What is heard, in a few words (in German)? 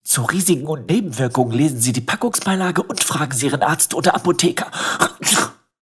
risiken und nebenwirkungen niesen